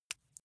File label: flesh wet greasy squish small